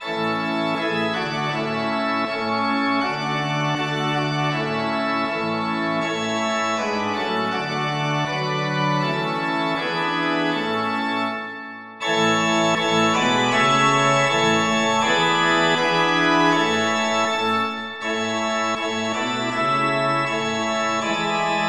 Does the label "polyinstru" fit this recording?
Yes